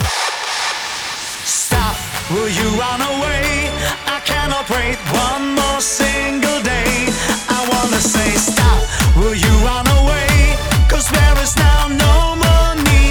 Male Mix, 140 BPM; Vengeance Samples; Vocal-Hook Kits; Song Kit; Stop!

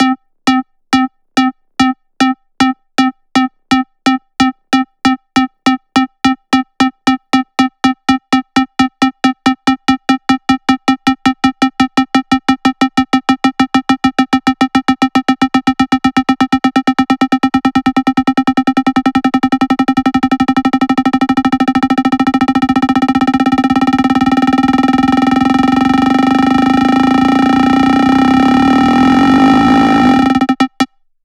128BPM Accerlator SQ C, Melody Fills; 16Bars; Vengeance Samples

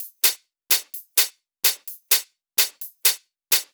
Drumloops 128BPM

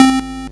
Arcade Riffs

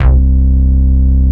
Basses C; Vengeance Samples

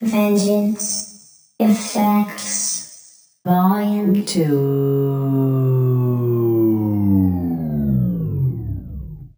Vocs, 128BPM; VFX2 Demosong

